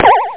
jump

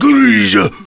grunt; Empire Earth

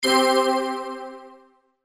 Correct Guess; Survivor Ultimate; Tapestry

